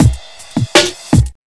Speed Loop Cut Time, Drums Beats

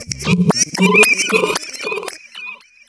FX REVERSE

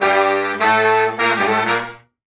Horn Sect Effect

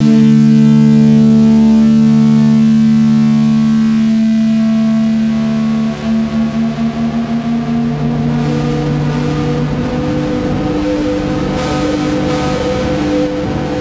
Bass FX; Basses

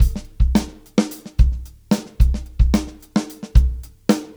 Live Beats, R&B Drums

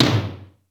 LOW TOMTOM, D110 DRUMS; Crypton - DrumBase5000

